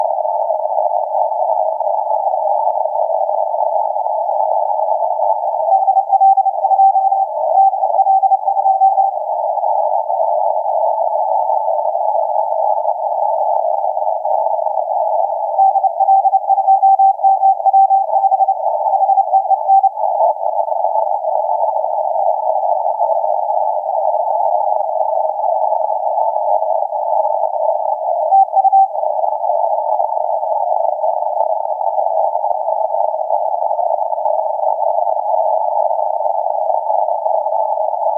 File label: 12m